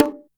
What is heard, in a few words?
DR-660 Perc, Drums, Boss DR-660